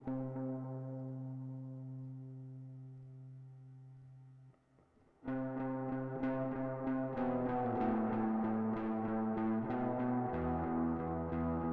Guitar; FULL BAND

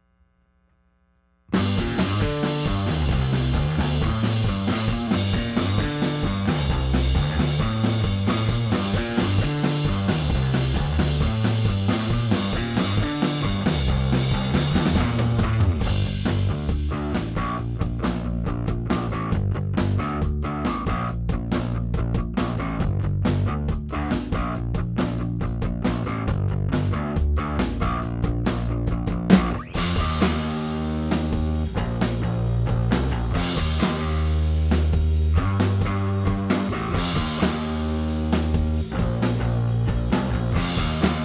Drums and Bass

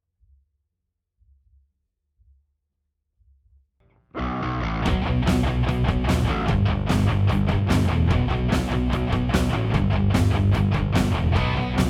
w Guitars

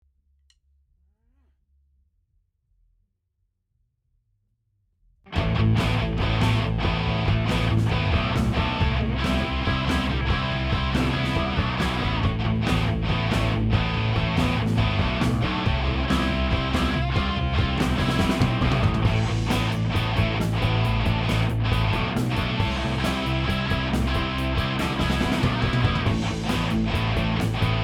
w Guitars

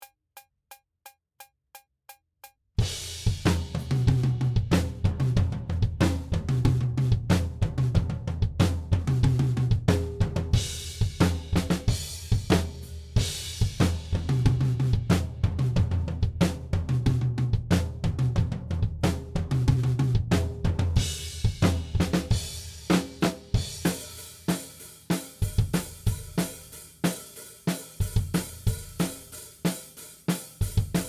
Drums ONLY; Pre Pro

